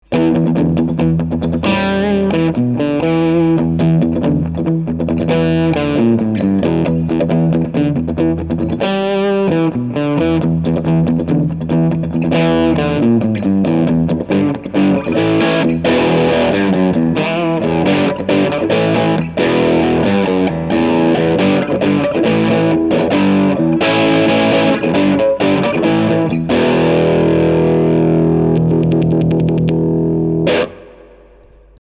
Crunch Les Paul Neck

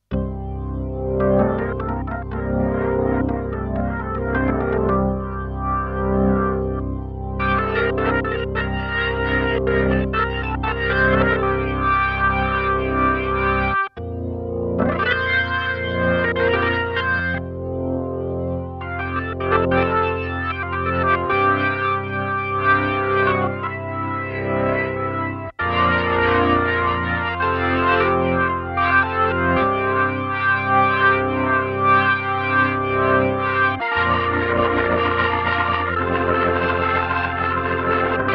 Organ Drive Dirty On

